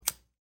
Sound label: lighter